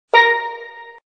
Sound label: Bb Drum, Casio